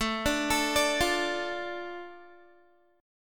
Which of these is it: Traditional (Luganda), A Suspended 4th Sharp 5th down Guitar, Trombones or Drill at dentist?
A Suspended 4th Sharp 5th down Guitar